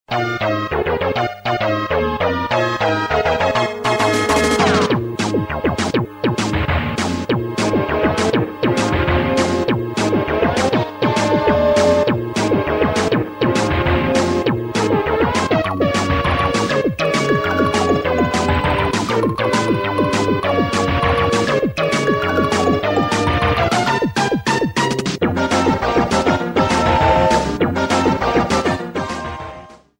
Music Battle; SMK

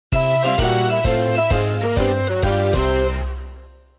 Minigame Draw

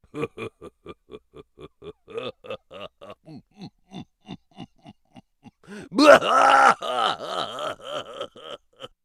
Low, Throaty Laugh, Human

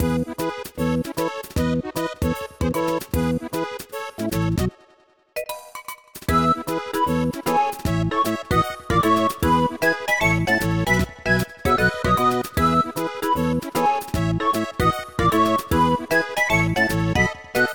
Super Pipe House; SMRPG

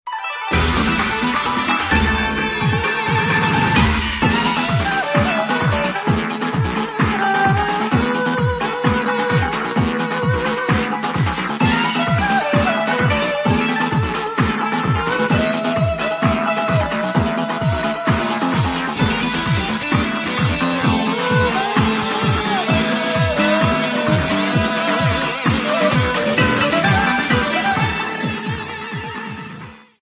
Dragon Palace music

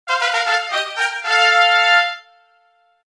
Level Up; Dragon Quest Series

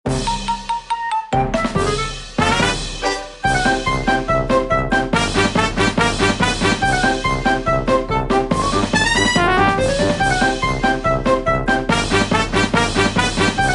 Ducking and Dodging

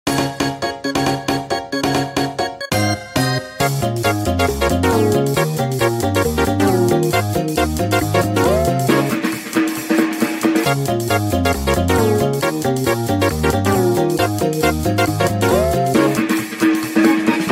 Bonus Music; SMM2; SM3DW

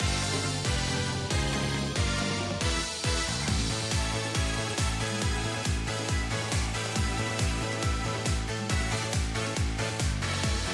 Heartbeat Skipper; PMTOK

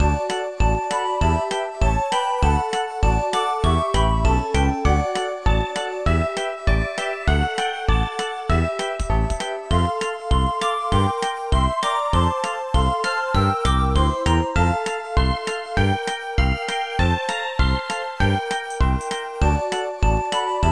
Music Gleam Glacier

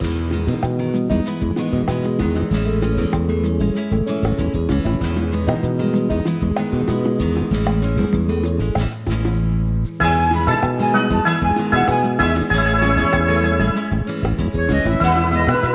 Epic Story; M&LPJ

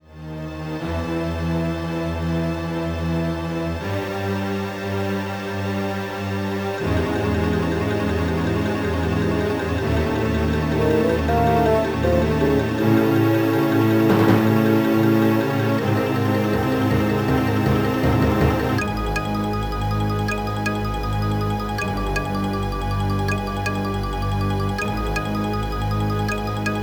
Tension

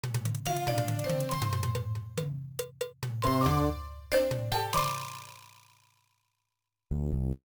Floor 1 Plus Win

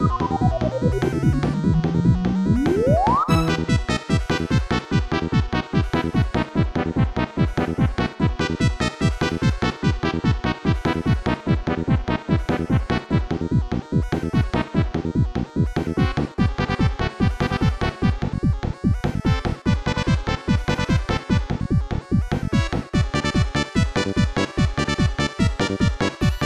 Tetris Music

